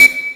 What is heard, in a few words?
beep; specialround; beatbox